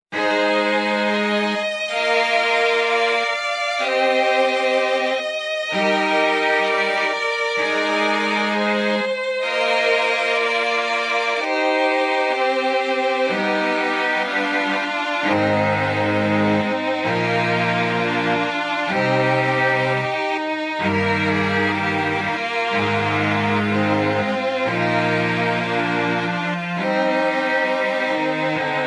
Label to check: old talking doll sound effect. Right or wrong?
Wrong